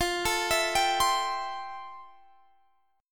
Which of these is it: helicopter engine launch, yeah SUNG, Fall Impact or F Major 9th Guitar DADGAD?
F Major 9th Guitar DADGAD